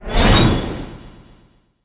slash; grave robber

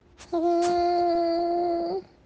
hum

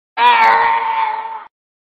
death sound; lego yoda

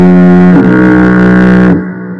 ship horn

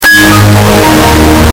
windows shutdown loud

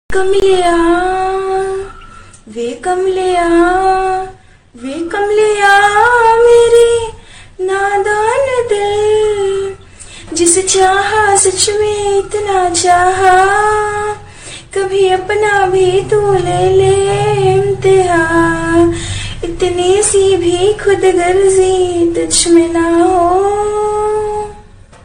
girl sing

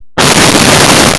explosion

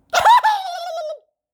jumpscare silly

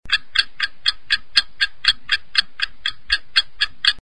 tic tac

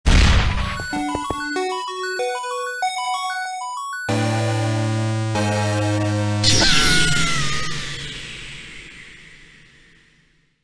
sword explosion; link

